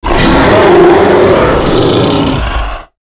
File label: start; slender; quadralex